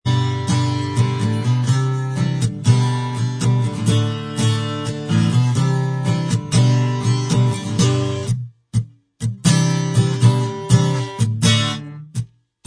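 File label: acoustic instrumental track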